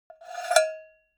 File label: object cowbell scratch hit hard